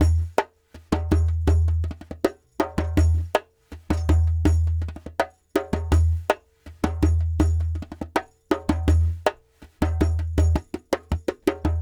Lg Dumbek

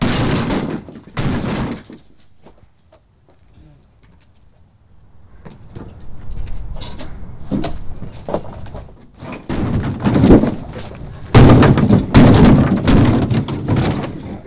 wood

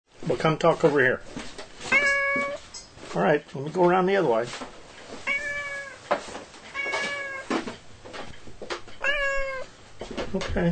in House Stereo

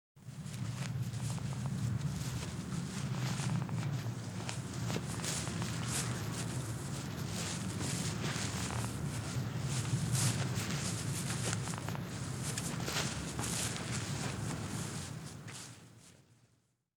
one person walking, Grass and Dirt; Butterfinger Video Sound Effects

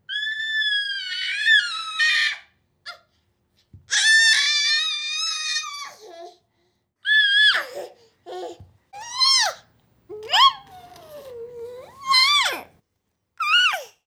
baby squeals; Asian Show Sound Effects

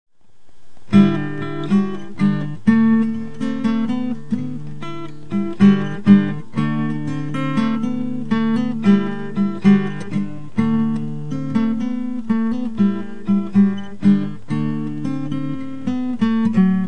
accompaniment

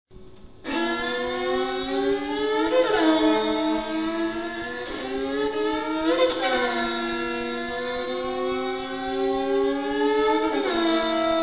Racecar

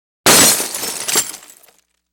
glass med safety gl; Asian Show Sound Effects

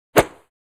swish single; crashes swishes impacts; Asian Show Sound Effects

